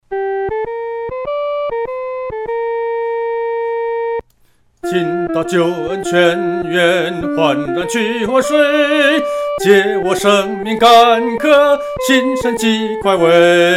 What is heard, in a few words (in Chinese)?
生命运河 独唱（第一声）